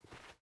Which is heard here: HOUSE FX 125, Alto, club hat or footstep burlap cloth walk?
footstep burlap cloth walk